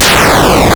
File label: Arcade Riffs